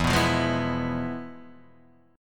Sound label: F Minor Major 7th Flat 5th, E